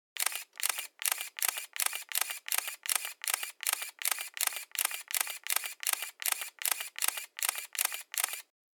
350d continuous shutter press

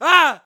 Argh

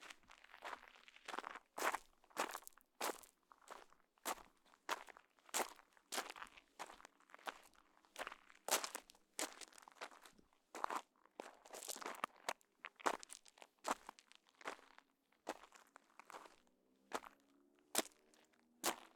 Gravel Footsteps

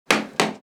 Hit

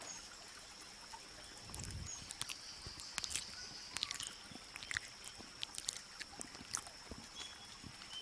Animal Eat

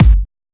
Kick Vintage house

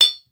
Glass bottle

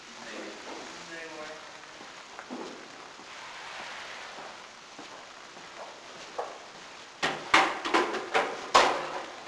Office

